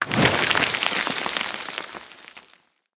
tank impact building

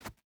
footstep hide sand jump start; Rust

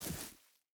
footstep rubber boots grass walk; Rust

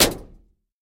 bullet hit outside; War Thunder

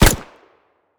lr300 attack silenced; Rust

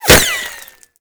impact masonry; Modern Warfare

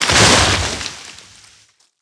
treefall down; World of Tanks Blitz

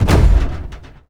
impact car light

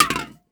can

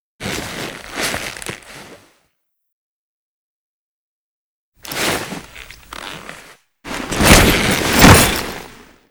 driver killed; favela; Call of Duty Modern Warfare

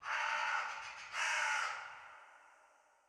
ambient winter birds; War Thunder

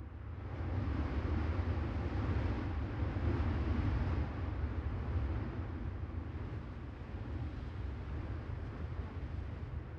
ambient battle; World Of Warships

